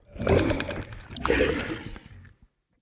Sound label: Heal, Vocals; Characters; Enemy; Corrupted Sproutfolk